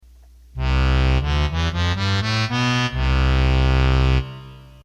Accordion Bass

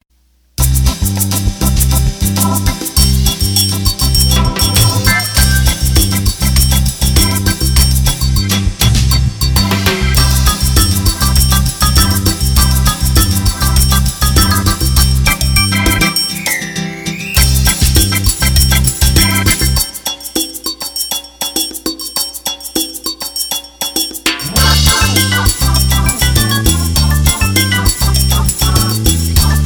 Samba Organ, Roland accordion; roland bk7m

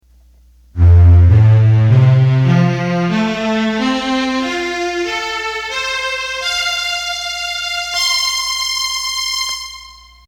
Strings

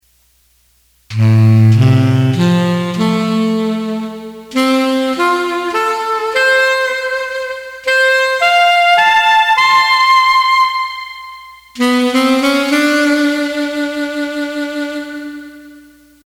ketron Alto Sax

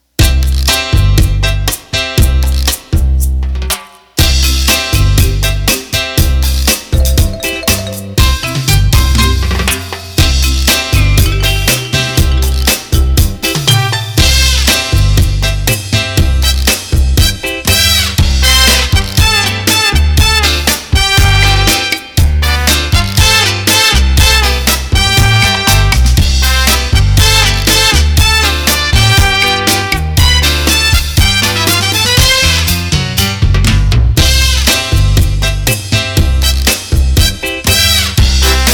Cha-Cha; roland bk7m

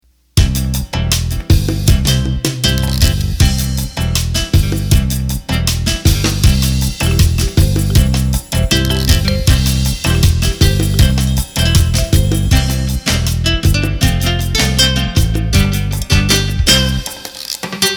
Calypso; roland bk7m

